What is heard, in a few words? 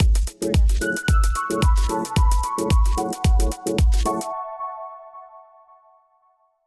lounge logo; soundscrate